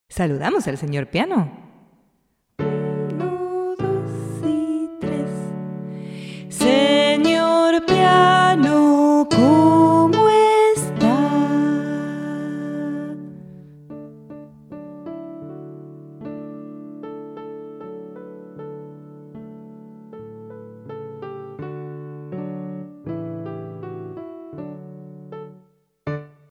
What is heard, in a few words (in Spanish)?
piano dormido